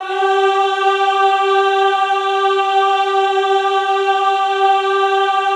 EHH G3, Classical Choir; Best Service; Extended Classical Choir; EHH MIX

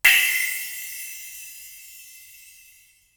Splash, drums singles; The Roots Drumloops

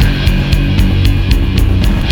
band track; Vermin; Sonic Foundry (Sony Creative Software); Ugly Remnants VOL-2

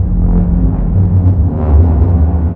Town Bass; M8

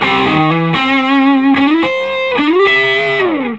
SOLO, Vintage Blues Guitar

